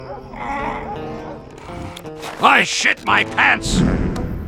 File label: fart; soldier